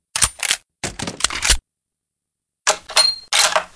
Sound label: cheytac reload